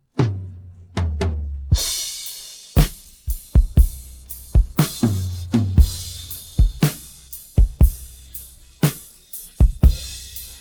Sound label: pattern, drums